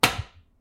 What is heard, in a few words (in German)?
Lichtschalter An